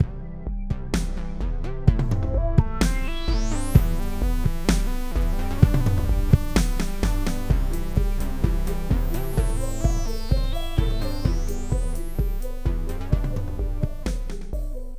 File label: EDM 8 Bar Song; Reason